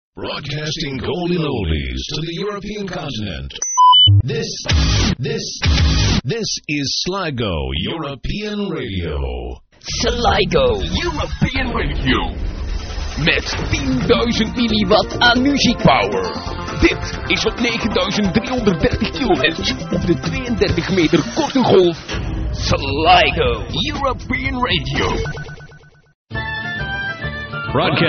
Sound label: jingle